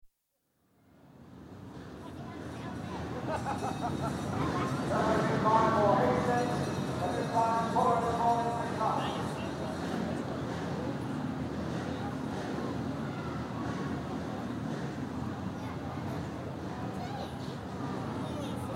Speedway, race with four passes, PA, loud cheers & hooters (Recorded from front of open grandstand, crowd's perspective); Crowd Ext Cheering; BBC